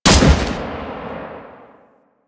Gun, Weapons; Jackal

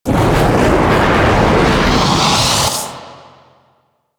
Teleport Build Up; Warframe; Zariman; Void Angel; Abilities

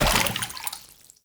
Walk Water, Footsteps; Characters; Enemy; Lady Deora

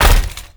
Necramech Melee Jump; Enemies; Warframe

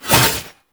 Void Heavy Scythe Whoosh Small; Warframe; Weapons; Duviri; Incarnon Adapters; Melee

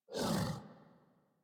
sleep idle wake, Kubrow; Enemies; Warframe; Tame

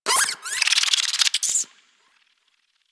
Rodent Lure Call Success; Warframe; Hunting Critters

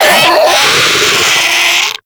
Infested Diseased Ancient Death; Warframe

